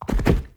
Stag Stampede Gallop

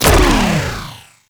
Infested Lich Mindhack Beam Loop End; Warframe; Abilities; Zeke

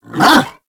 Kubrow Tame Bark

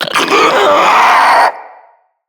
Infested Puss Ancient Charge

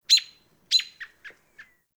Bird Robin; Warframe; Ambience; Nineteen Ninety Nine

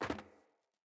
Sandals Land Metal, Footsteps; Player

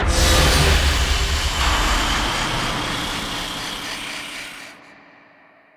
Queens Platform Raise; Warframe; The War Within; Queens Lair Scene Twelve Choice A; Cinematics

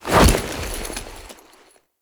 Foley Melee Sweep; Warframe; Characters; Kahl

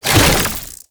Wall Climb Jump; Warframe; Oraxia

